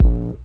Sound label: bass rhodes punch; Punches Hits Discoblasts